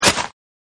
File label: Plant; Sunflower Land